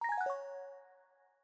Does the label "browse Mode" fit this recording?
Yes